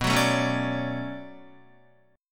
B-Minor 9th B down Guitar; Bm9; strummed; JGuitar

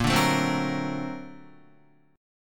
Bb Augmented Major 9th Bb down Guitar; 4E,4A,5D,5G,5C,6F; Bb+M9 strummed; JGuitar

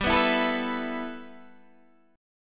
G 6th Add 9th G Guitar Standard, chord; G6add9 strummed

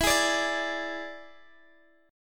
E 7th E down Guitar Open G

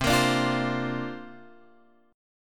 C 9th Suspended 4th C down Guitar Open F; C9sus4 strummed

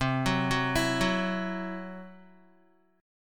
C Major Flat 5th C down Guitar; arpeggiated; CMb5

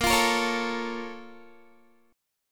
A sharp Minor Major 7th, down, Guitar; A#mM7 strummed

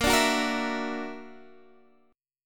Gb Augmented 7th Bb

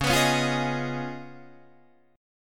C sharp 9th C sharp Guitar Open D

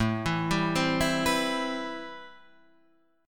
C Major 7th, A, guitar chord; Cmaj7/A arpeggiated; JGuitar

